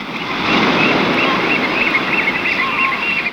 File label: SEA, SEA-WATER; Best Service - XXL Production Effects